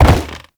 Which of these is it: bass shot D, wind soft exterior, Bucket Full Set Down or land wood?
land wood